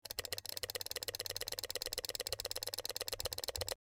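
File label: Low pitched Mouse Double, mouse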